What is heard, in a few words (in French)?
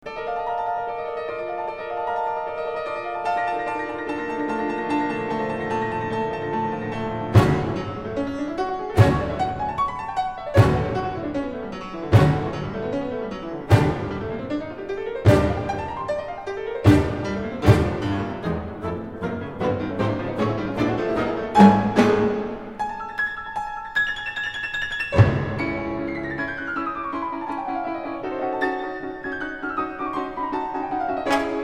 poulenc concerto 2 pianos